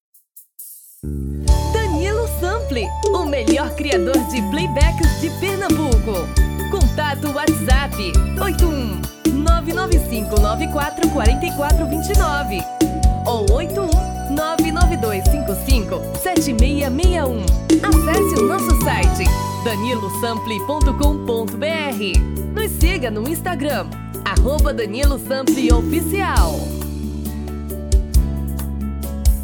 tom feminino

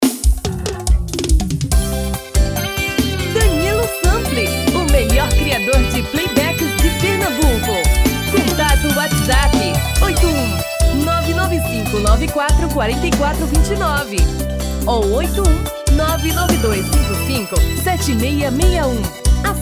Brega

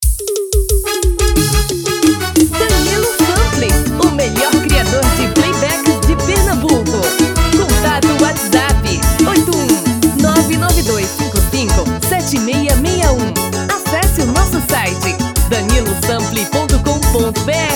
Vanerao FEMININO

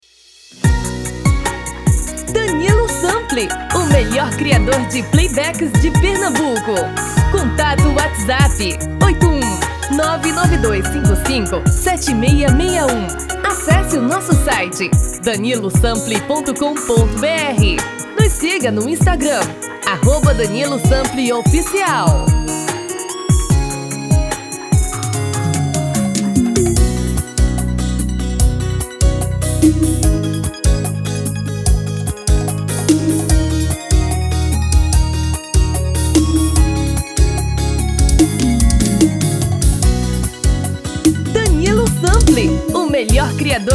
Brega

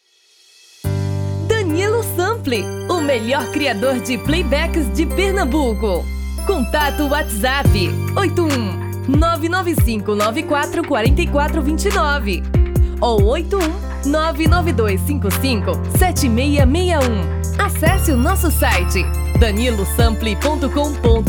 tom feminino

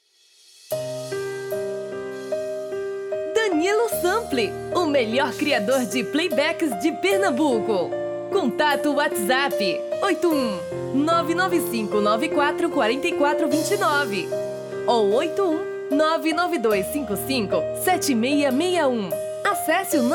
Vers Piseiro

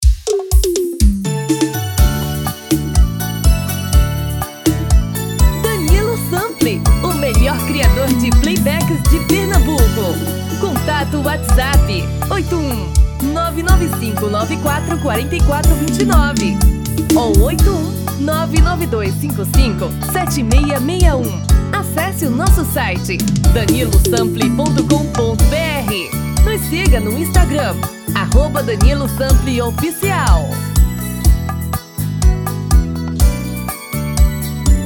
Arrocha

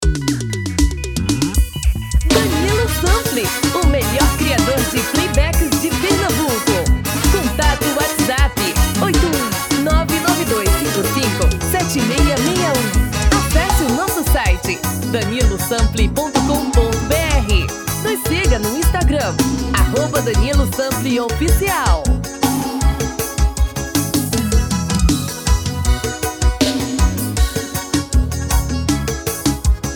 Forro

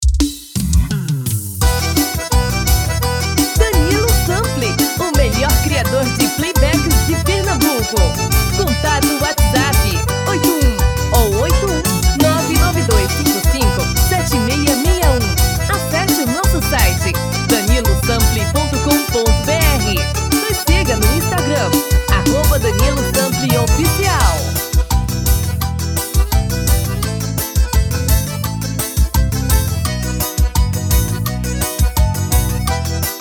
tom feminino

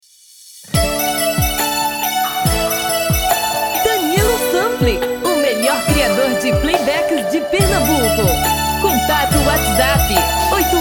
Brega

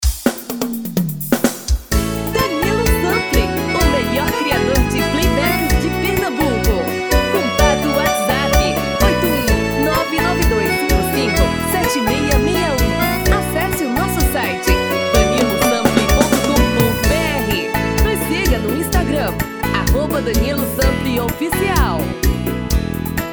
SERESTA

